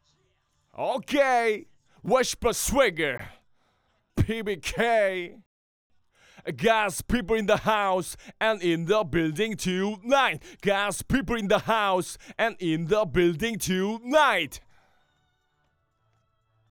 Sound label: rap male intro